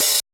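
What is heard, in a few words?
OB-DX open hi-hat; Drums In Your FACE!; OB-DX KIT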